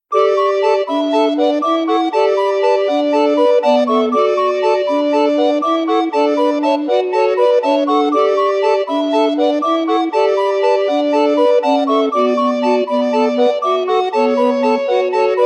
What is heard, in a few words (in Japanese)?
リコーダー四重奏